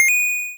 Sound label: pickup coin; crazy eights